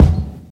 kick live; Kicks; drumkit

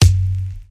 Boom-Bap Kick; Kicks; drumkit

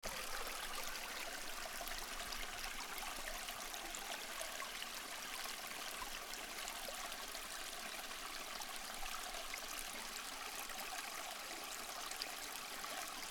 Small Stream Flowing, Ambient Sounds; Debarup Travel & Films

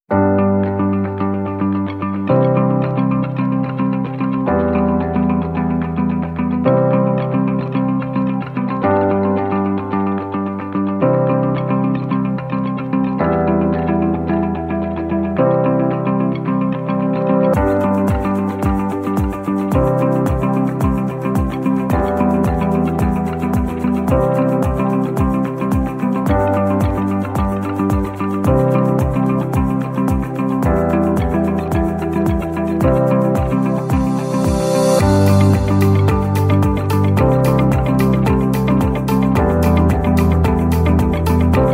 Commercial Song

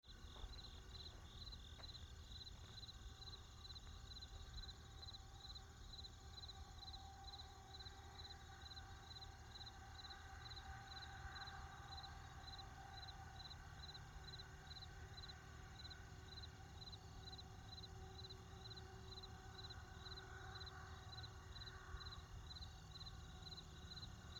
Crickets with Road and Forest; Debarup Travel & Films

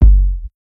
KICK MURDER; Kicks; drumkit

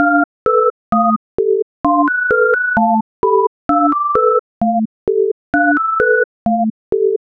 goofy ahh song

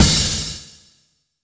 vinyl crash, Drums

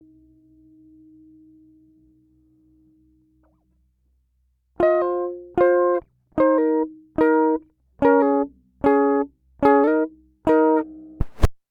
Clean guitar

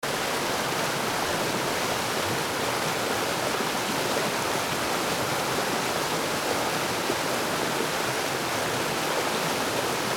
waterfalls, Ambient Sounds; Debarup Travel & Films

